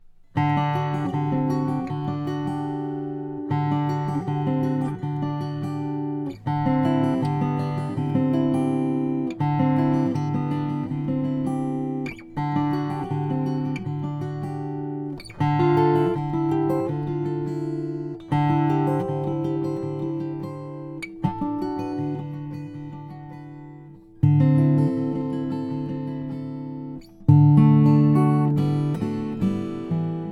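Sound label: froggy bottom m deluxe cutaway german spruce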